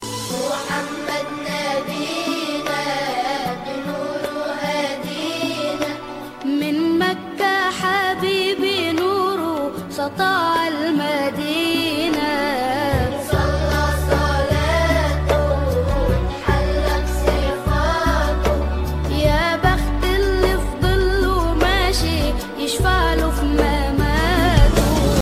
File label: mobile islamic ringtone